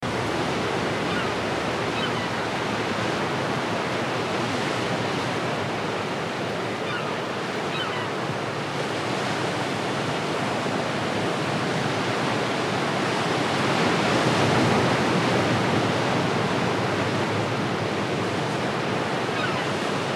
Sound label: ocean